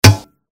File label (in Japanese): 矢が金属に刺さる; モノラル